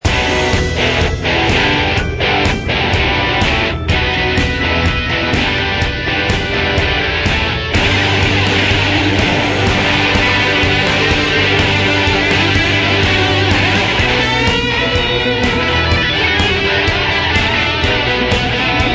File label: Spooky Theme; kickbuttowski; mellowbrook